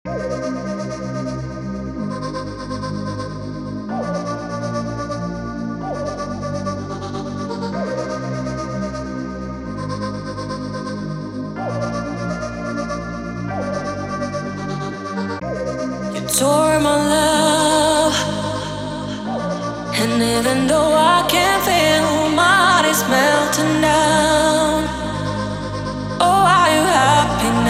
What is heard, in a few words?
pluck